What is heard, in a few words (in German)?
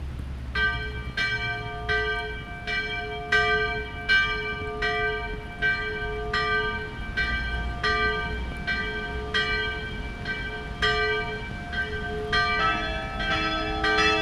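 Glockengeläute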